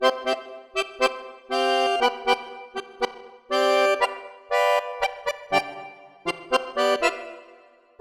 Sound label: Wind Dance, ringtones; Huawei P9 Lite EMUI 5